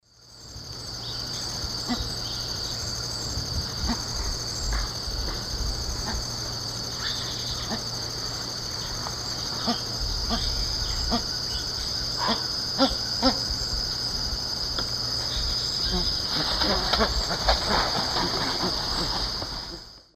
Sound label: Tigrisoma lineatum, call; S. America; Eastern Lowlands